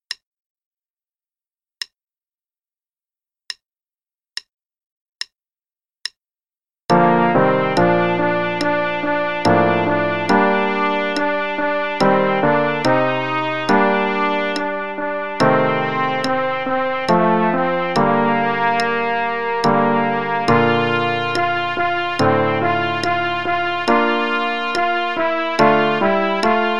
Play-along - Jana Gana Mana, trpt part, in concert Bb, qn=72; Natl Anthem with trpt part; Indian Music